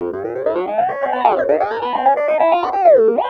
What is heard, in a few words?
Clavinet; Ambient Grooves